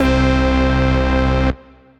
4 OSC, Synth Lead

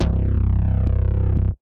Bass; Ableton; Skitter and Step

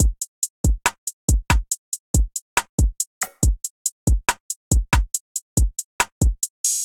MPE Pinnacle Kit, Trap Drums; Trap Drums by Sound Oracle; Ableton; Factory Packs

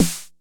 Snare 909, Drum Machines; Drum Hits

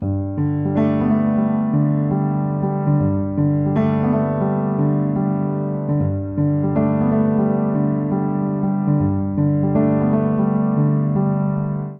Phrase Mellow G Major 80bpm, Upright Piano; MIDI Clips; Upright Piano by Spitfire Audio; Ableton; Factory Packs

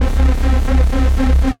Nervous Build, Basses; Ableton; Creative Extensions; Racks

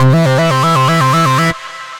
Drone, Synth Rhythmic; Smackdown Drone; Ableton; Factory Packs; Punch and Tilt

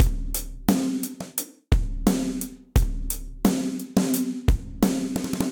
Snare Multirod Dry 97bpm; Fort Knox; Ableton; Factory Packs; Session Drums Studio

